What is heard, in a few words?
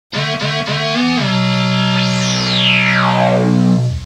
buzzer game over